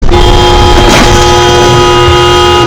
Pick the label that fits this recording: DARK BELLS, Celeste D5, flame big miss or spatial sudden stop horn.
spatial sudden stop horn